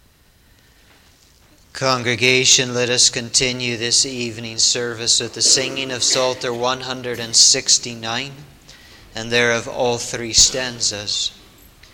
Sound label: Singing; The Lord's Crowning Goodness; psalm 65 11